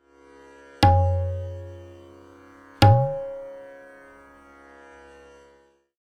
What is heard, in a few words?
Bol Syllable Dha; North Indian tala cycles